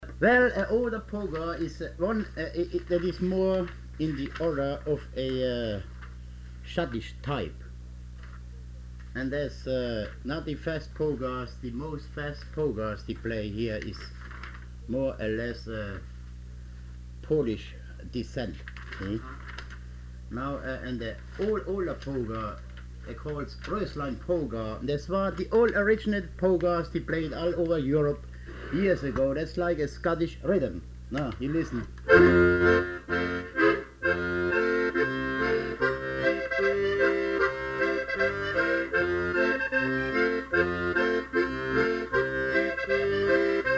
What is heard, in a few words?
Polka; Flower Polka